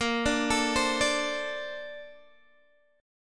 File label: A sharp Major 9th, Guitar, DADGAD